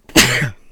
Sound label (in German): Niesen